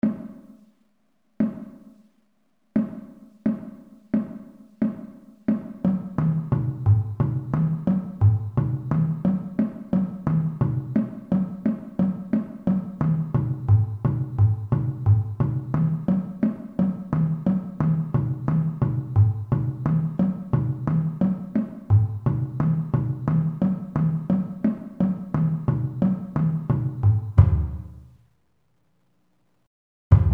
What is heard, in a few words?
bass split, drum, marching; slow; Warm-Ups